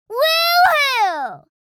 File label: Woo hoo cheering girl child kid